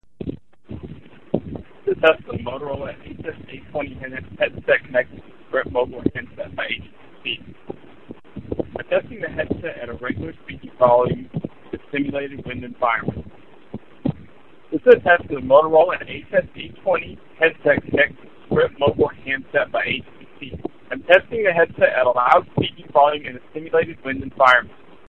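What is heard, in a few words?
Wind, headset; Moto HS820; Mogul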